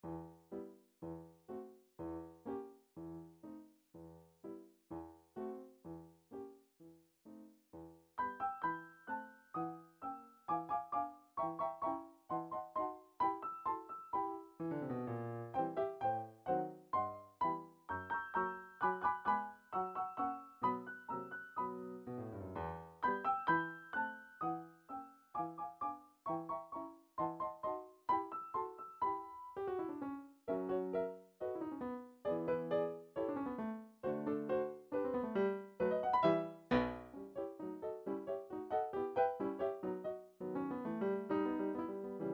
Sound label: piano, midi